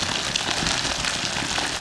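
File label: paint nozzle waterfall short loop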